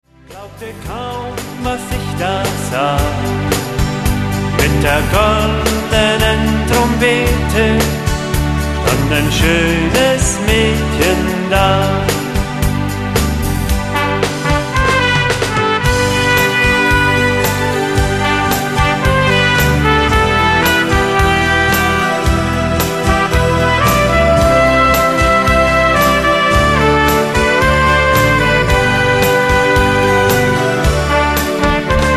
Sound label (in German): Trompeten Melodie